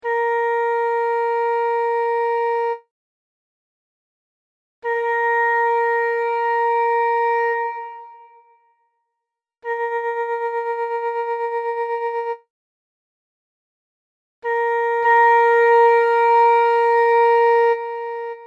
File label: Modified Tone